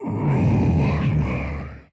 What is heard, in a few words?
grunt